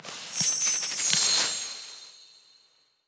ill window appears

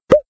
Minicopter Destroy

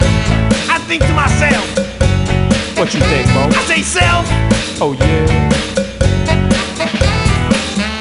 Cowbell; gamerip; Happy Feet Two; nds

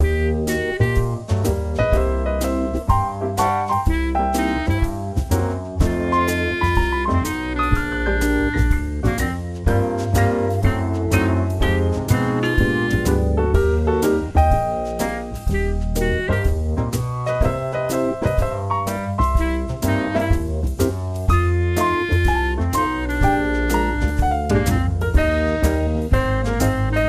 serving music

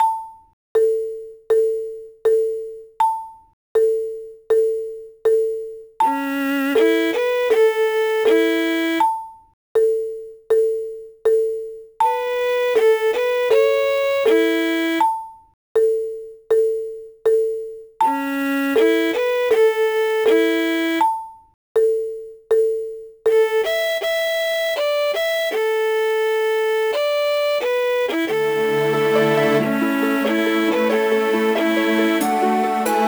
Duet; the legend of zelda spirit tracks; Gage Duet

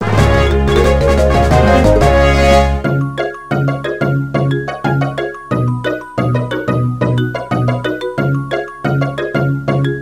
Battle Victory; Mario and Luigi; Bowser's Inside Story

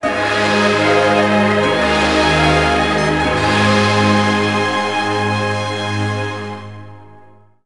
Sting Boss Defeated; Kung Fu Panda 2; NDS

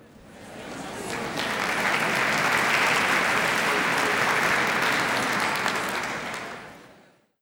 crowd big outro

